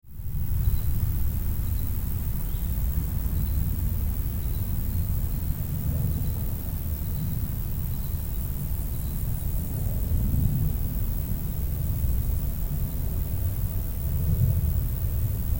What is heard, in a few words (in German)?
wiese